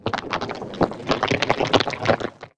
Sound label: Mantis Idle